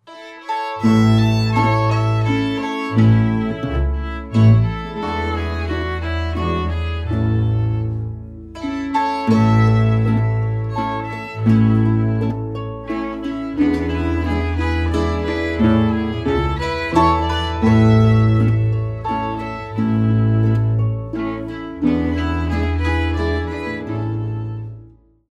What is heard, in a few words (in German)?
Spielmusik Zwei Lenteler